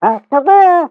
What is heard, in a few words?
Pyro taunt flip exert